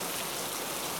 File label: rain, weather; ambient; minecraft; TBMPack